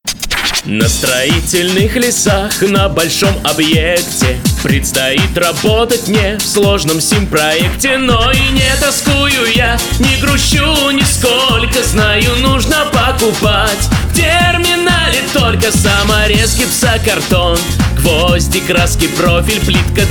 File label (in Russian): vokal